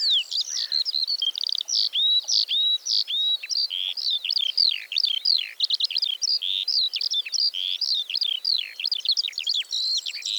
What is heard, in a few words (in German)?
Feldlerche Alauda arvensis